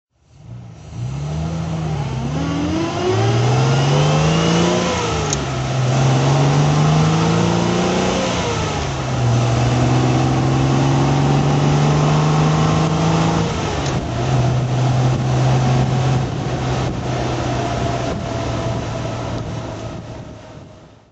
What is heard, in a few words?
healey 3000 mkII 3carb drive